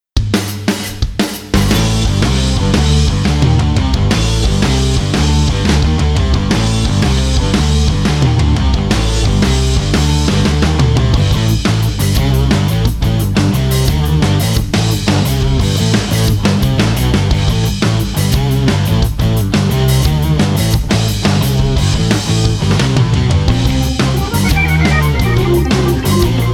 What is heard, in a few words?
Instr